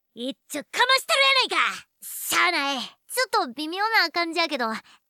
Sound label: Vocals